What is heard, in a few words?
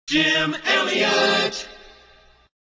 jingles logoset accapella; priority one